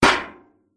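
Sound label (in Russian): zvuki politseiskoi dubinki, police baton sounds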